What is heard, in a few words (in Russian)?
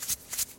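солить еду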